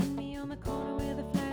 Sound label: Rough Mix L